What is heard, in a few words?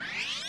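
sniper laser power up; allegiance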